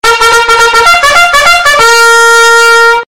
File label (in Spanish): trompeta; blues